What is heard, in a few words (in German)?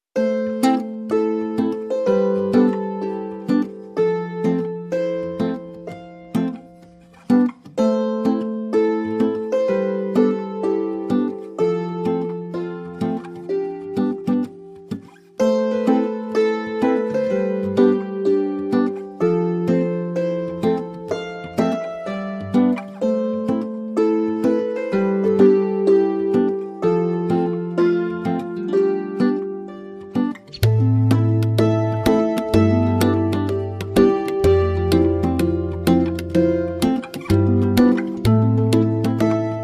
Reggae Am Gipfel